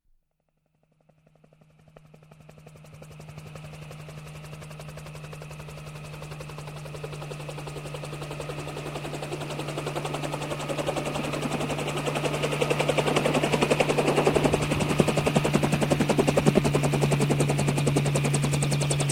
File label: Helicopter Lands on Helipad